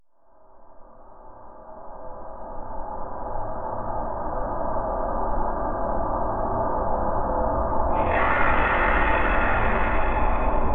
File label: Vocal Drone Version